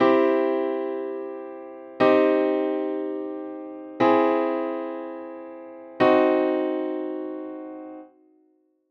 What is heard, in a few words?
chords 3 notes; triades